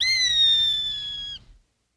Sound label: Hawk Screech Scream